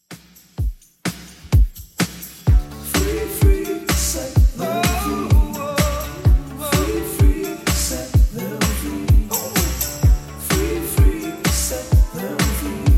80s Redrum 127bpm

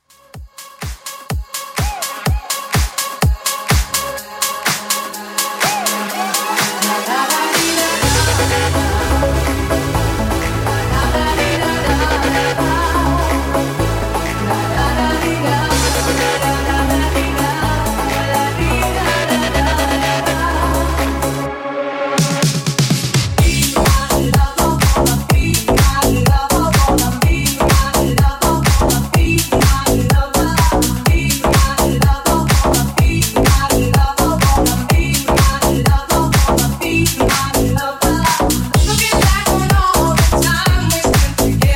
Bootleg Hook Edit 125Bpm Clean

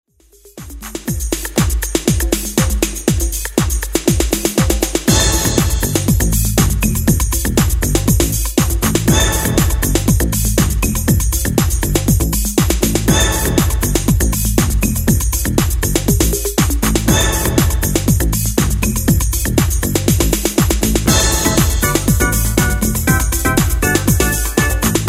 90s 120bpm; Redrum

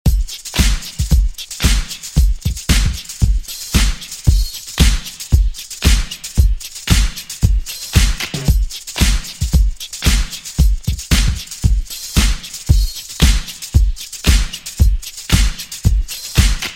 Funk 80s Redrum 114Bpm

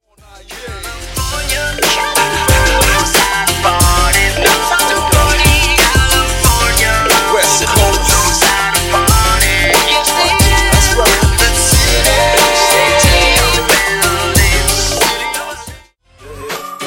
OLD SKOOL HIPHOP; END of YEAR PACK 10

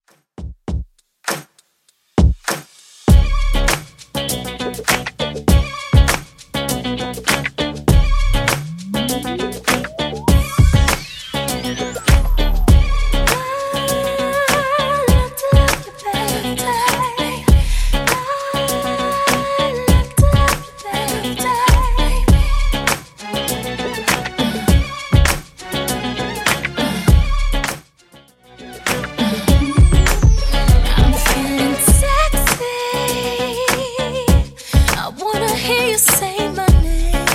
Rnb Redrum 100bpm

